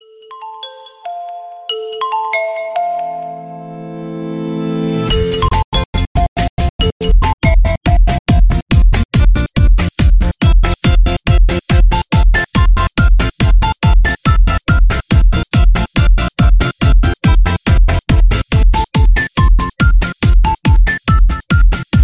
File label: oppo remix ringtone